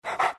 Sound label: pant small, greevil; courier